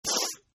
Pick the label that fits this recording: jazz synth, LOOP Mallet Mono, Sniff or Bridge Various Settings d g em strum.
Sniff